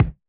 Hiphop kick; Reverb+EQ+Comp+Limit

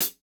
hihat, house

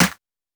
Hiphop snare

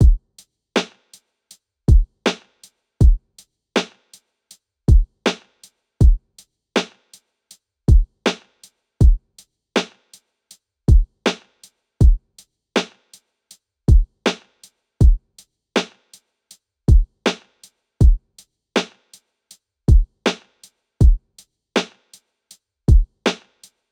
Jazz hop loop 80; Hiphop

